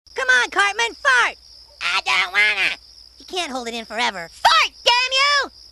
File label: Fart